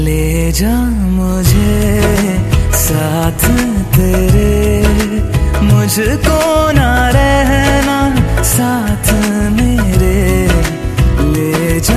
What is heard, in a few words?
Romantic Hindi Song